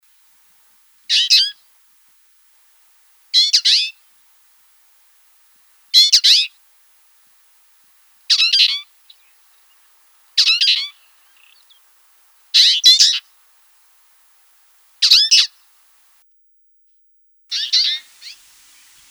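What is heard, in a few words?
twite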